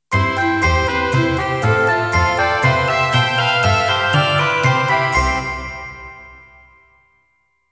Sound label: waltz; brilliant